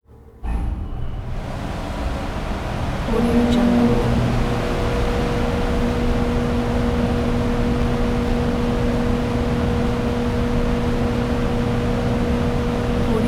air conditioner start run turn off; prosoundfx

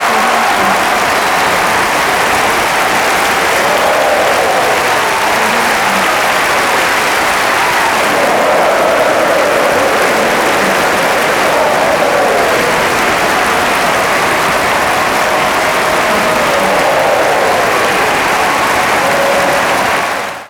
crowd cheers

